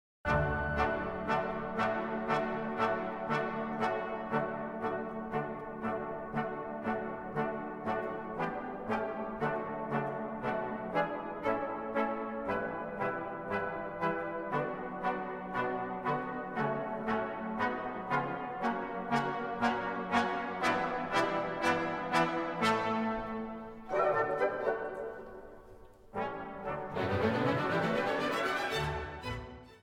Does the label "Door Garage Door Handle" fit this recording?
No